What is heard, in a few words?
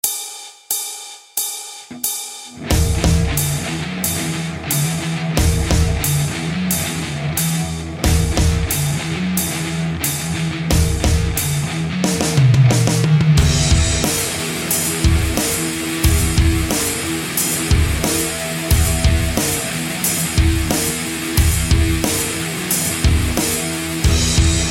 Harmonic Synth